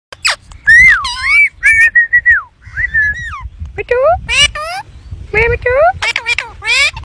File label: Alexandrine parakeet; شاه طوطی الکساندر